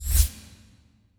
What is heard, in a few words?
hero slide late; ui; dota